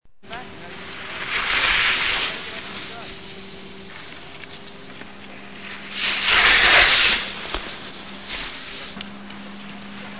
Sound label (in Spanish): Esquiador